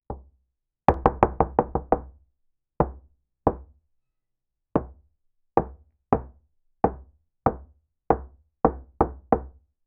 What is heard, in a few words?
hammering on wooden, carpentry